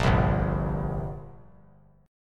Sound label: G Minor Double Flat 5th, G; strummed; JGuitar